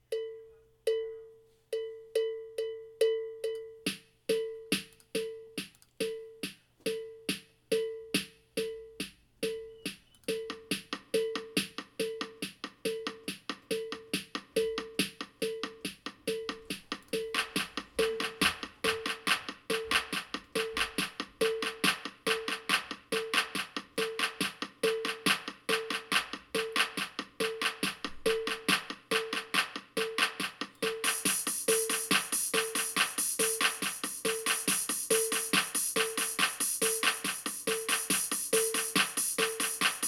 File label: drum trackz